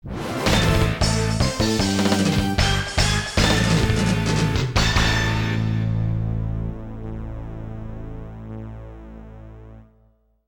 勝利ジングル; Langrisser IV Original Soundtracks

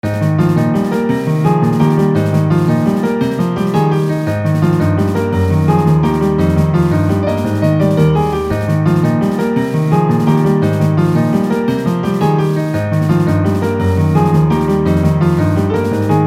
ステージクリアBGM; Tower of the Approval

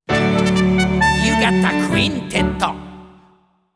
ミュージック・ジングル (スコア); You Gotta Quintet Songs; ユーガッタ・ミュージック・ジングル (スコア)

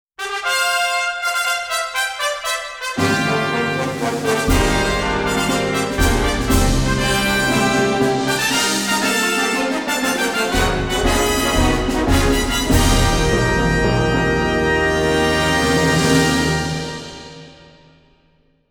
スカウトレース ファンファーレ; umamusume pretty derby winning live 17 2024

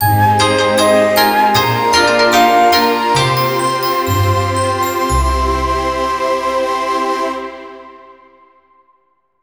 ファンファーレ; Touhou Soujinengi V; PS Vita

